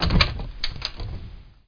Door Open

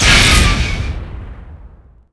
beam hit; baddys; tflegion

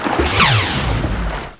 boat

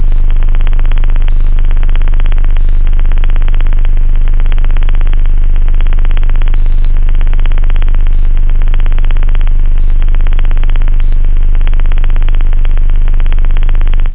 sub base, bass, synth; da capo vol1

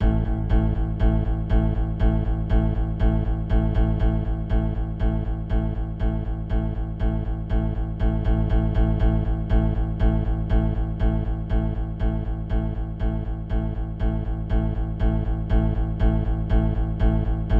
music; swars

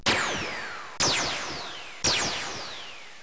PHOTON, Misc; 2000 Sound Effects

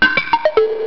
SKRPLUNK

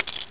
SLOT

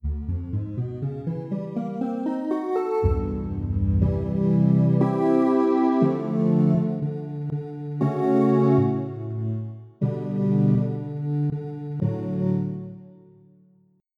Reed Organ

